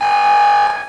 alarm

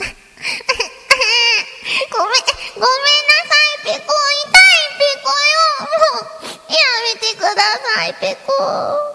cry

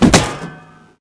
repair metal

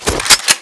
k2 draw

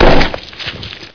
explode; floater

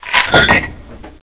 checkpoint

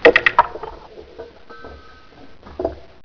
button

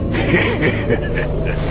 haha; sven; zombie town; merchant

